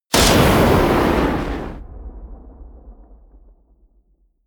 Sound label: missile large fire helicopter close